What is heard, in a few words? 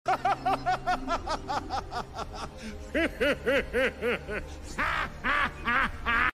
laughs; sonic the hedgehog 2; dr robotnik; audio meme